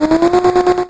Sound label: duck